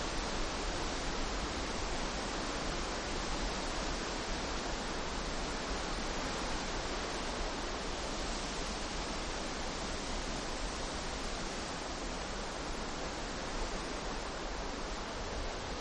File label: Moth Wingbeats; alaska acoustic highlights; INSECTS